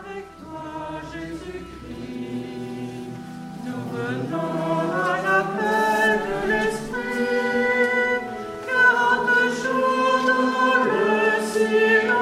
chant entree

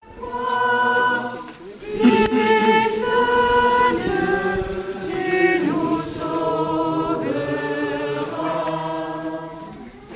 chant entree intro

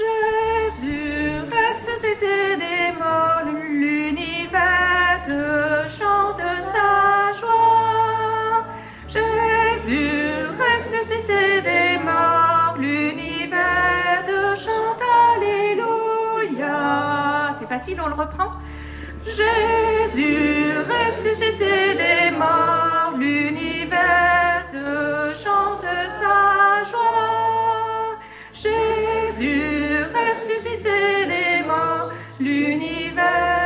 chant entree

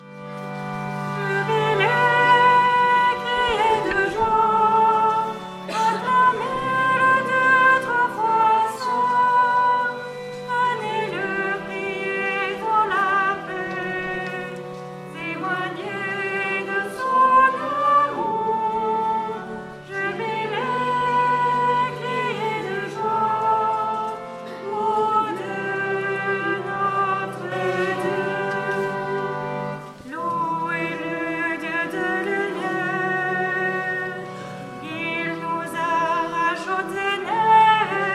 chant entree

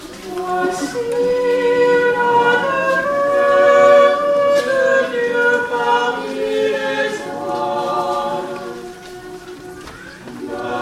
chant sortie

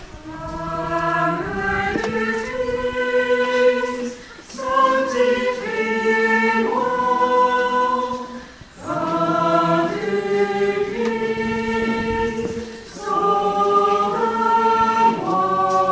chant communion

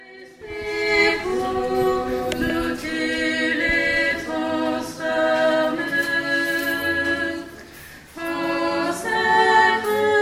chant offertoire

